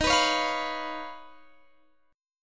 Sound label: Eb 7th Suspended 2nd Sharp 5th Eb, Guitar Half Step Down